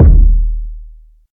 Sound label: smooth bottom end kick drum D# key